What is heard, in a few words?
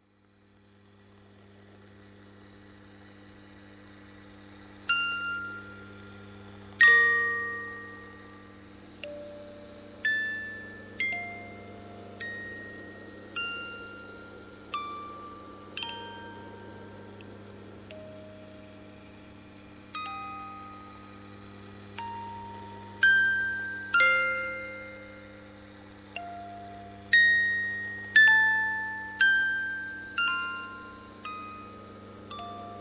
music box